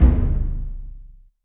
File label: oo daiko, taiko; AKAI S6000 CD-ROM - Volume 5